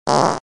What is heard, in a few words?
fart; tf2